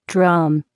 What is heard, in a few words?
drum